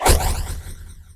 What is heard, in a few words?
glaives; weapons; dota; luna